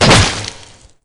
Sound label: hit; slender